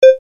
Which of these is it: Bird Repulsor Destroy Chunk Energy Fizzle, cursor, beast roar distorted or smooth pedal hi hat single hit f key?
cursor